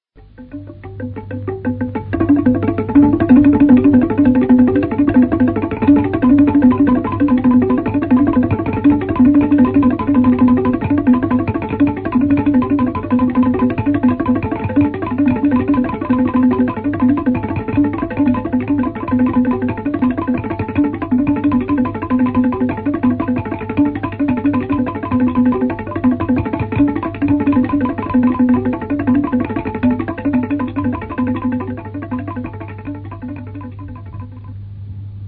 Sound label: interval signal; radio uganda